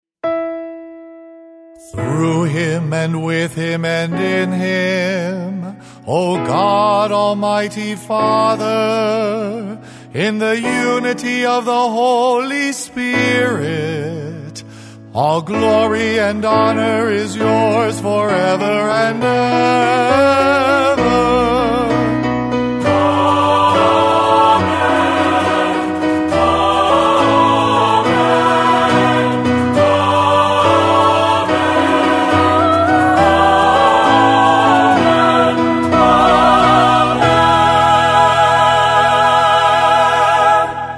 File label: Amen; mass settings